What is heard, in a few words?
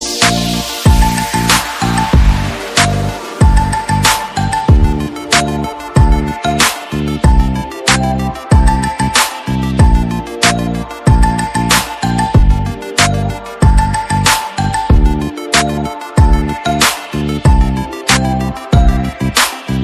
Pied Piper